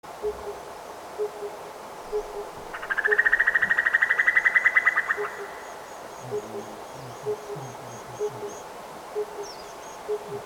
eurasian Cuckoo Cuculus canorus, Ladakh

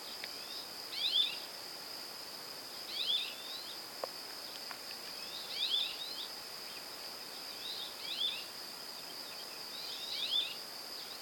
Rock Sparrow Petronia petronia, Ladakh

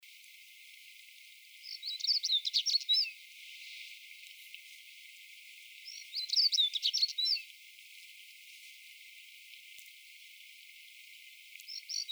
Siberian Stonechat Saxicola maurus; Ladakh

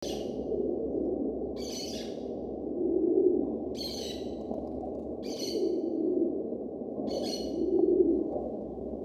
Rock pigeon Columba livia; Ladakh

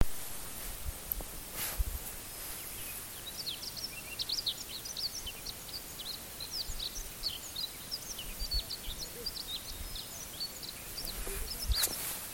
Himalayan Rubythroat Calliope pectoralis, Ladakh

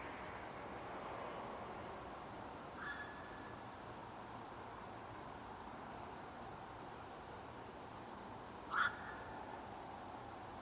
Little Egret Egretta garzetta, Ladakh

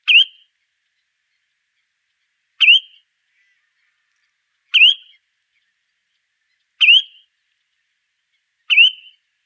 Spotted Redshank Tringa erythropus; Ladakh

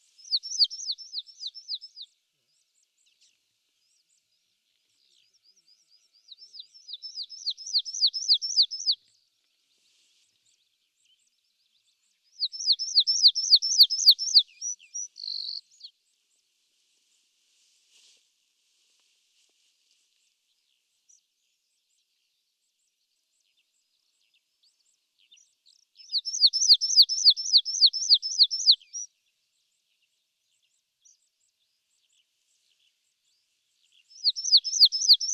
Rosy Pipit Anthus roseatus; Ladakh; Issi, Nubra

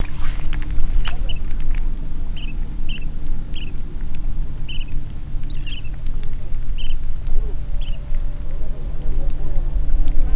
Greater Sand Plover Charadrius leschenaultii; Ladakh

